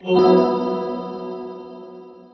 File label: Unlocked; Initial D Mountain Vengeance